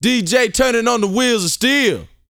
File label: VOCAL F; ILIO